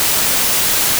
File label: noise